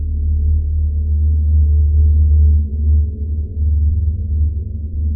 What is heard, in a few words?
ambience